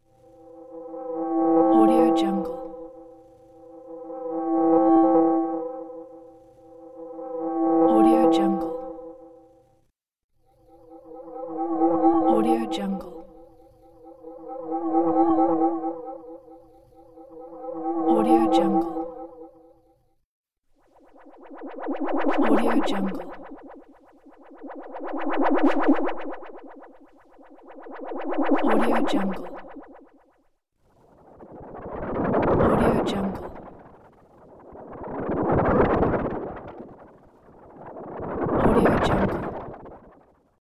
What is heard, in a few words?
alien alarm